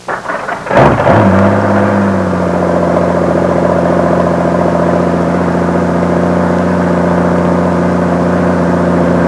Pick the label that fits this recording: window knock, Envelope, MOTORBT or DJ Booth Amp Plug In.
MOTORBT